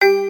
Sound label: step; Hot Star